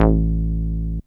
Bass Long A; VEC1; Club; Long Basses

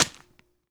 Crunch

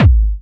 BD Sub; VEC1

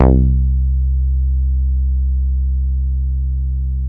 Bass Long C; VEC1; Club; Long Basses

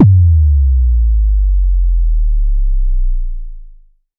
BD Sub; VEC1; Club

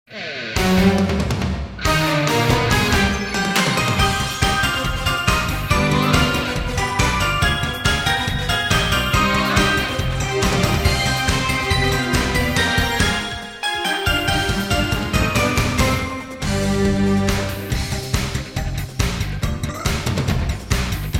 Karaoke; SailorMoon; TV

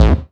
Bass A, Offbeat Bass; VEC1; Club

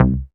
Bass C, Offbeat Bass; VEC1; Club

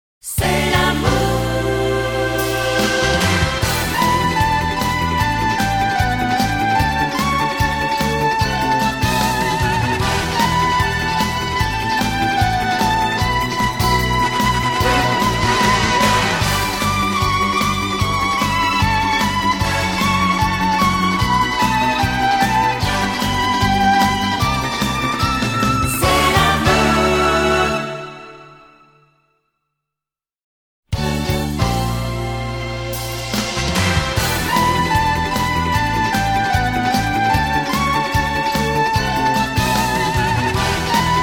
Music Box; SailorMoon; SMS Preview Music

